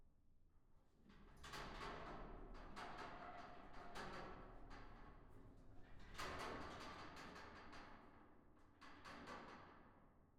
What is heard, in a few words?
amb metal shake; room 01; ambient